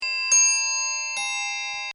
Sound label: Clock Mantle Three Quarter Hour Toll; Clocks; Bobby Cole